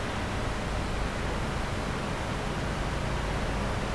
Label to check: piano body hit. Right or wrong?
Wrong